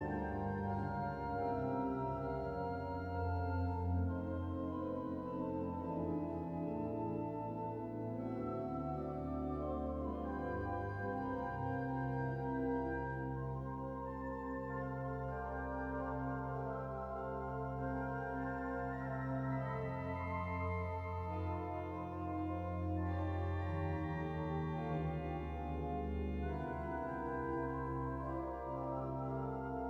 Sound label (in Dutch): Koraalbewerkingen ( orgel )